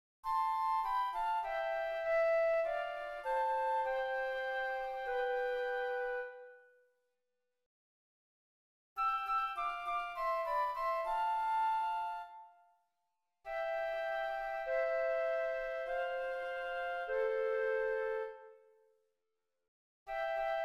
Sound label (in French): flutes